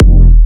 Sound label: Big Kick